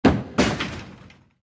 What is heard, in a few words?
ball Fall